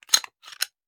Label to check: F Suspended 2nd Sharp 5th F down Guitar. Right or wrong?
Wrong